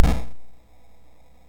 sid, MOS6581R2; Donkey Kong 1983

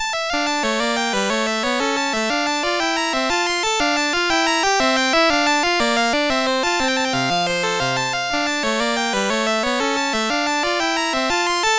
MOS6581; MOS6581R2

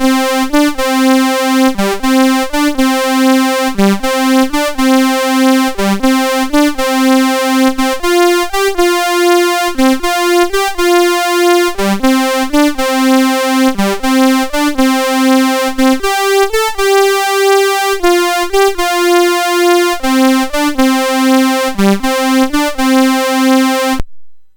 Commodore Shuffle Riff Blues, MOS6581R4

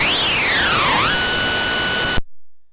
Fingernails on a Blackboard, MOS6581; MOS6581R4

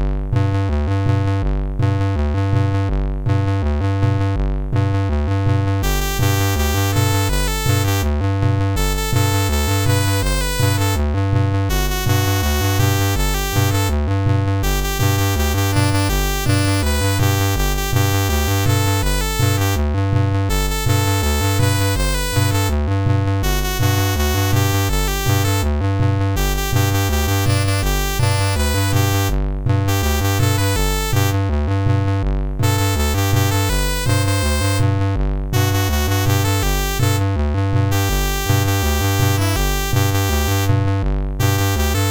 MOS6581R3

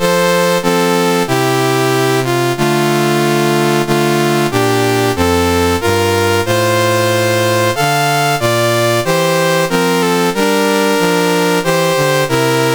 Brunei, National Anthems, CSG8580R5

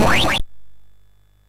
MOS6581R3, sid; Powers of Gloom; hvsc

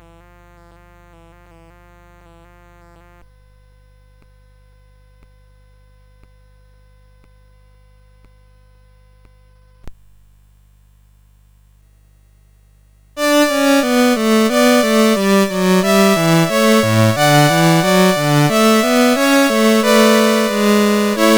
Trio Sonata, CSG8580R5; Op3 No2

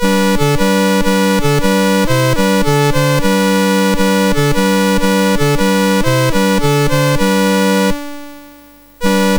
BASIC sid MOS6581R4; Crac Mur

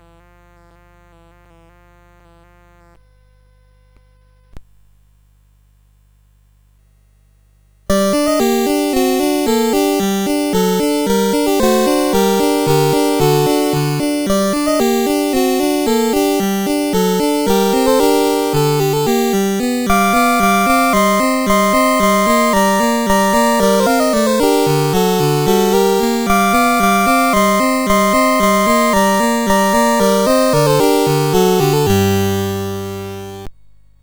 CSG8580; CSG8580R5

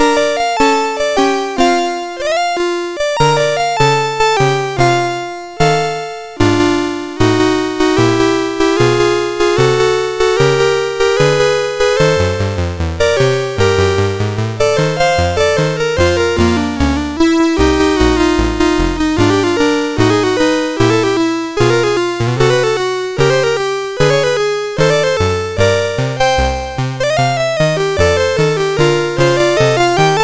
rag, MOS6581R4; Frogimore Rag

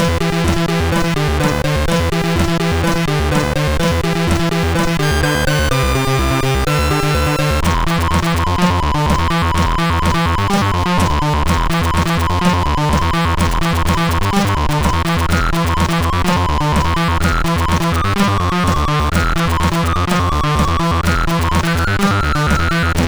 bassline intro, MOS6581; MOS6581R4

